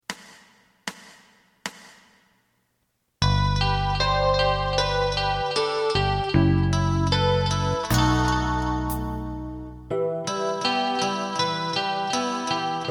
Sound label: lead